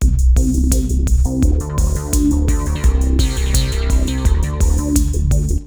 ACID, Tekkno LOOPS 170; Masterbits - Tekkno Trax

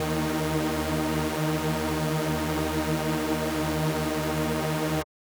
SuperSaw D#4; KG MegaSaur; M8

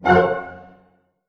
C MAJ, Orchestra, ORCH HITS; Sound On Sound; Peter Siedleczek's Orchestra

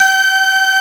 BELVOIC; East West; Global Sounds

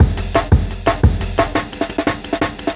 DNB BREAK

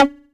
percussion, drum machines, FutureRetro FR777

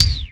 FILTER, Drum Hits, SNARE

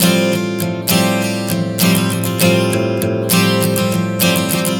Strum 100 F9, One Chord Strumming 100 bpm; Acoustic Guitar

